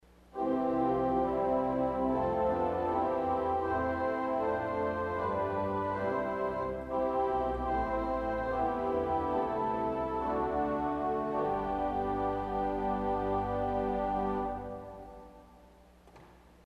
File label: St Anne String Celestes, organ stops; CulverAcademy